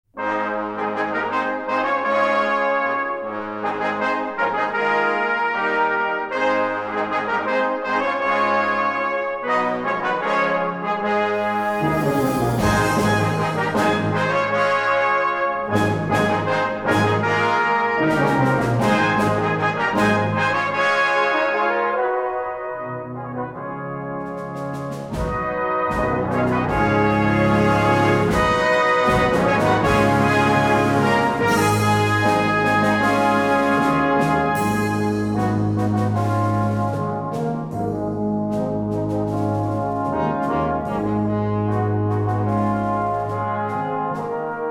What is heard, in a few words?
fanfare and gigue